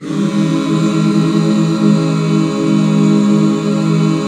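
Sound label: HAL C# minor, Back Chords; Sound & Vision - Gigapack II CD 1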